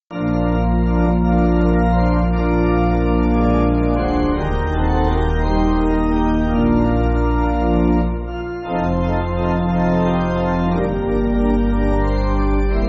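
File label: Pipe Organ, Backing Files for Churches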